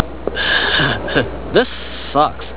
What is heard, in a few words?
pain